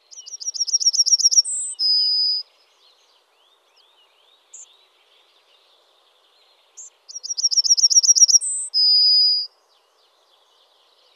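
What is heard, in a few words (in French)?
Bruant jaune, les oiseaux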